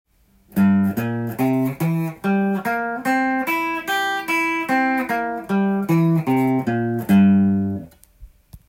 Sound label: c7 chord tone, guitar